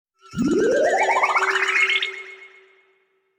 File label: Tap; Yu-Gi-Oh! Master Duel